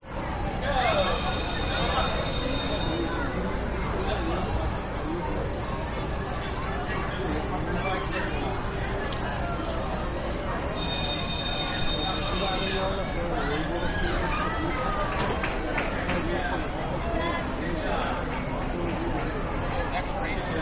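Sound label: Amusement Park Bell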